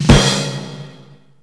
drum final; drums

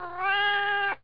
babies exclam; Desktop Themes 2000; Tiere

